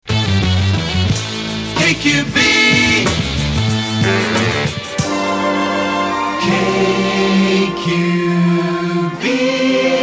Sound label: fuzzin